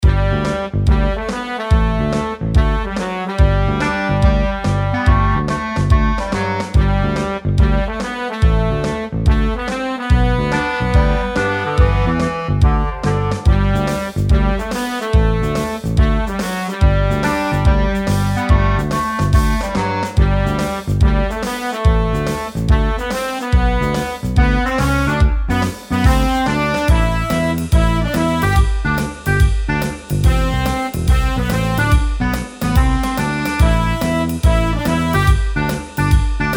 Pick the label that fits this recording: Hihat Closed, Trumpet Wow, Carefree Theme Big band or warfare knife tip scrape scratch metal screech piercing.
Carefree Theme Big band